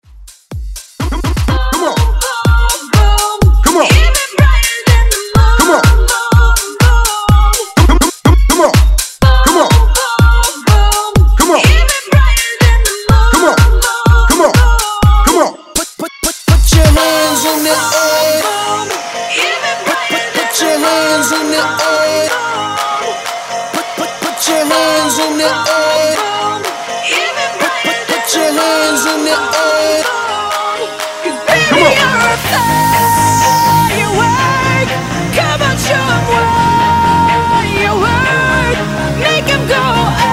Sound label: epic intro